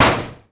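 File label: metal hit, Impact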